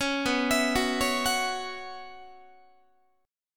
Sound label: B Suspended 2nd Suspended 4th B Guitar, chord; Bsus2sus4 arpeggiated; 4A,4E,5A,5D,5G#,6C#; JGuitar